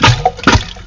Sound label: plumbing, Water